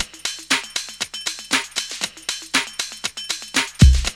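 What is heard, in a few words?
drum loop; X-Static Goldmine 3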